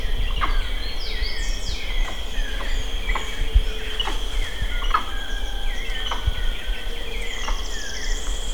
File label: Cebus apella